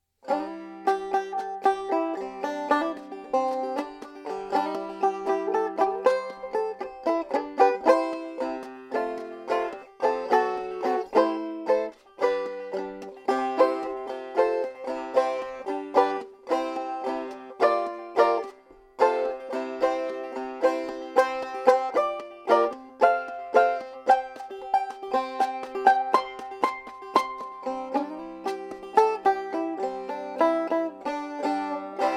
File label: A frail, neck wood